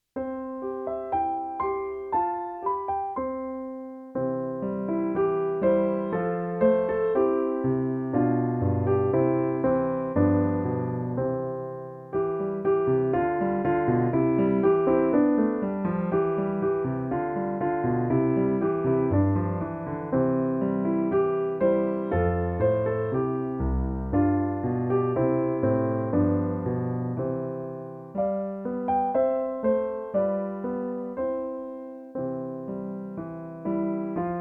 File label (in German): Alle Voegel sind schon da in C